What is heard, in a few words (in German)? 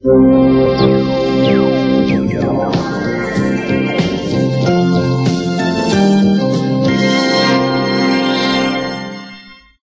jingle